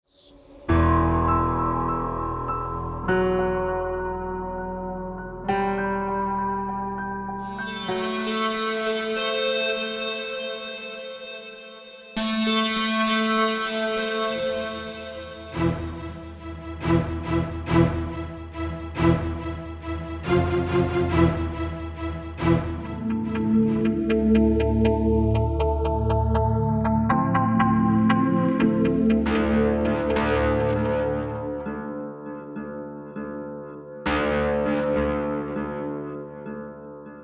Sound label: melodics